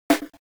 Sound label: Drum SD Rim Close; WarioWare Inc